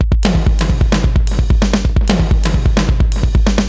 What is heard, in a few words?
Space Echo HR-16B 130BPM; musicradar; cyborg beats